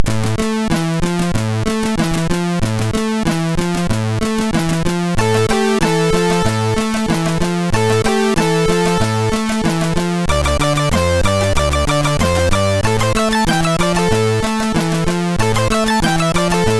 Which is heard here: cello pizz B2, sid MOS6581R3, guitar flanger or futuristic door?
sid MOS6581R3